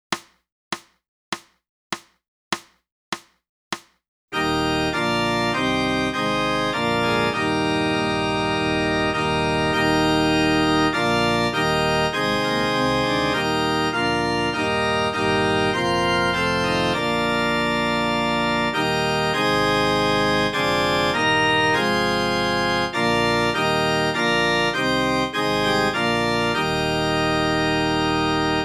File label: sax